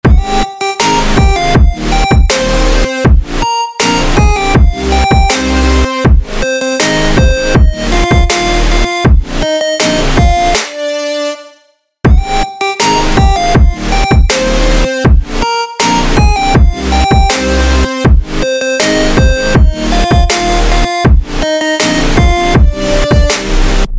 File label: bgm ringtone; raja rani